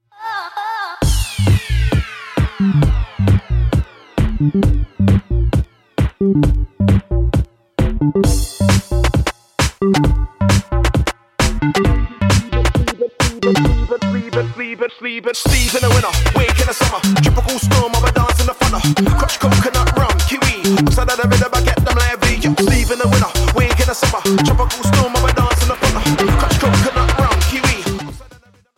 marimba

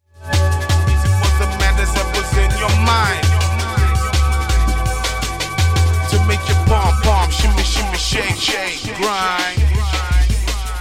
instrumental

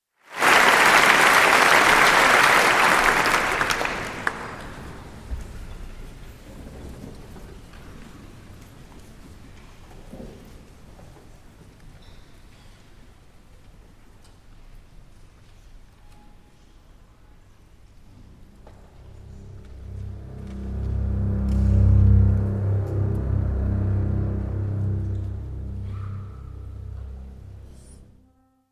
mezzo soprano alto flute percussion and strings; gnosis

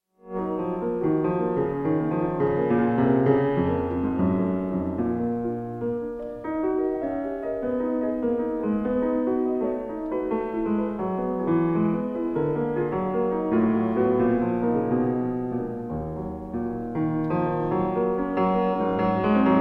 impromptu in g flat major for piano; fryderyk chopin; op 51

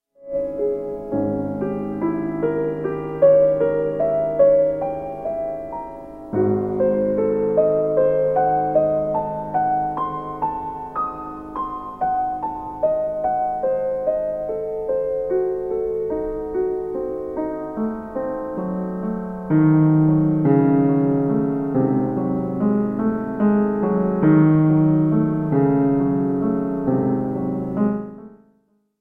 sonata for piano in c minor adagio sostenuto; ludwig van beethoven; op 27 2; moonlight 1st mvt

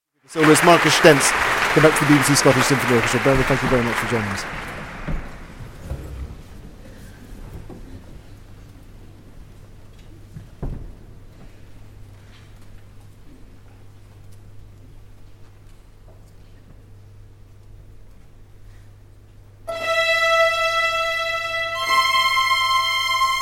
concerto for piano and orchestra; bernard rands

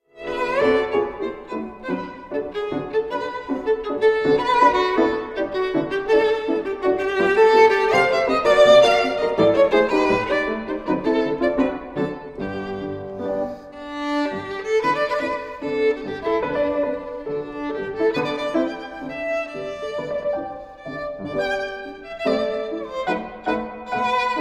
syncopation vers for violin and piano; fritz kreisler; jack liebeck

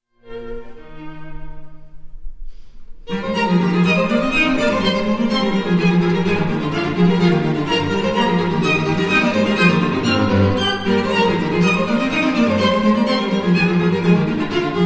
string quartet in E flat major; ludwig van beethoven; string quartet no 10; op 74; harp

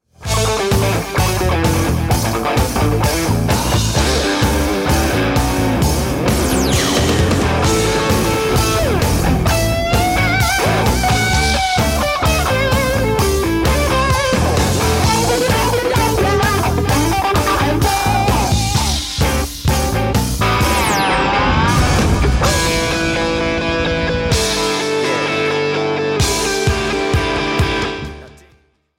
guitar

